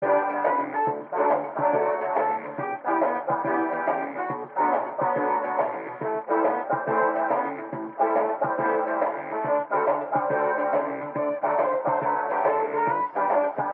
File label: radio music ambient; Mirror's Edge (2008)